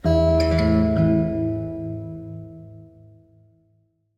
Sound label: guitar